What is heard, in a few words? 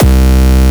Basses Root C; Vengeance Samples